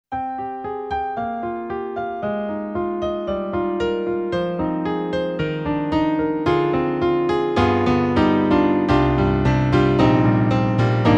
Roland SR-JV80-09 Session, XP-60

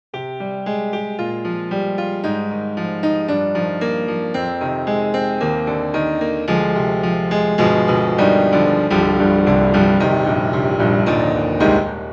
Vienna Concert Grand Extrabass; Native Instruments

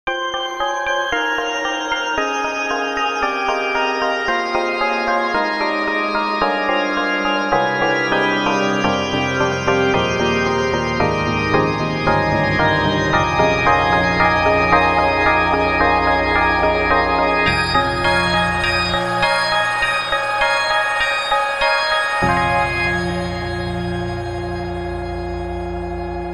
Ice Piano; Cakewalk; Rapture Expansion Pack Biolabs Vol.1

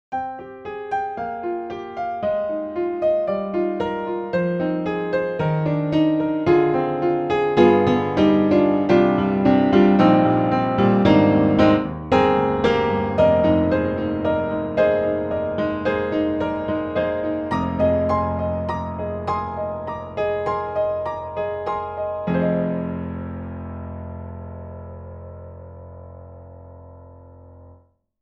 Warm Modern Upright; Upright Pianos; Synthogy; Ivory II

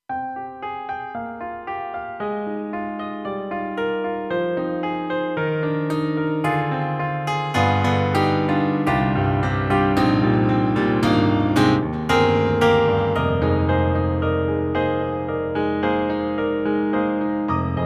KORG M3 XPanded Piano Looper

